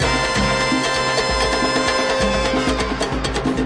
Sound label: horn congas latin